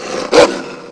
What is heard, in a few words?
grey wolf stand